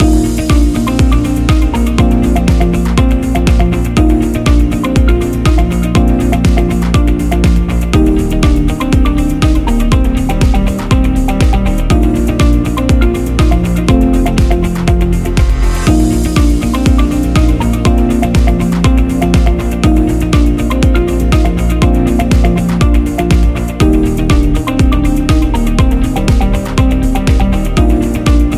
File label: Background Loop